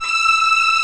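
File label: arcato string; OMI - Universe of Sounds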